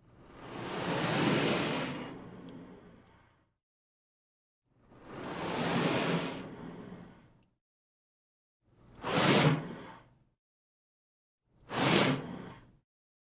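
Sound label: Water Spray Interior Car Hood Up; Car Wash; Spraying; Big Room Sound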